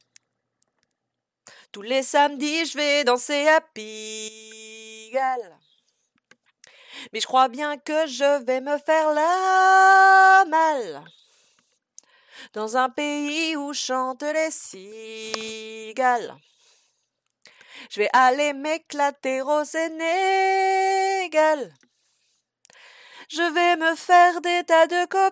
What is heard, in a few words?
tenor; Piste ténor